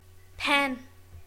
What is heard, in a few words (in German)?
fuellfeder